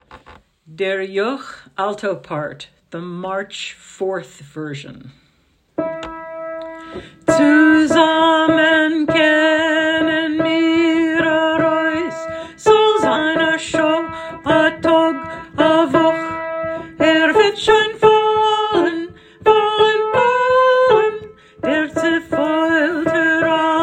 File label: alto part; Der Yokh